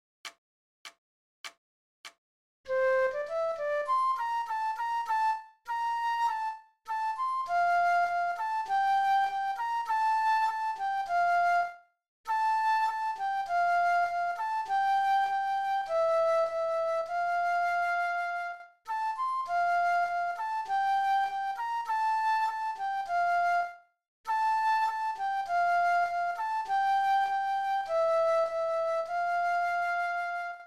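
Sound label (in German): Floete, 100 bpm; Muss i' denn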